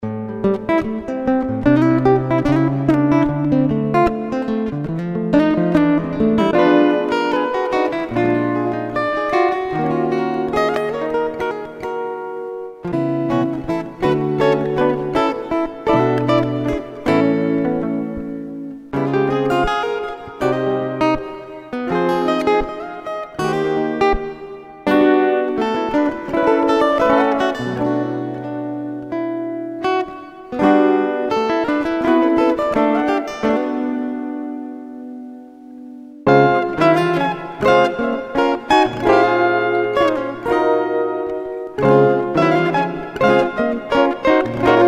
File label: Acoustic Guitars; After Hours Acoustic Guitars Vol 1